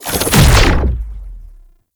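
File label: Stab Cloak Exit; Enemies; Abilities; Warframe; Tau; Dax Ninja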